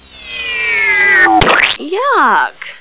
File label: fly yuck